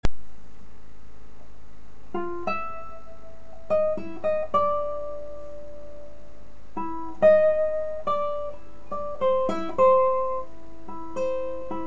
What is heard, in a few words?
tango